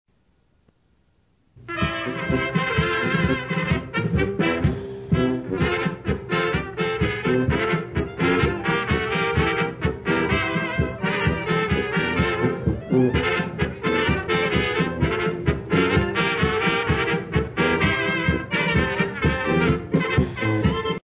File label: YOUNG WIDOW POLKA